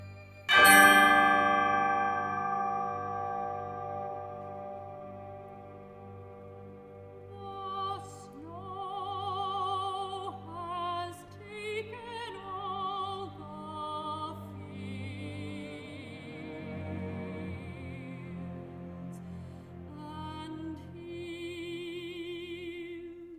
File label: Quiet Birds